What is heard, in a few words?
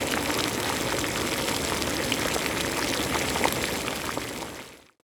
Cursor Harvest Fizz; the princess and the frog